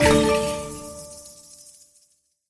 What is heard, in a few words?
show all win; games; Aztec Gold Megaways